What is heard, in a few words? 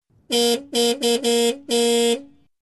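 Old Car Horn multiple times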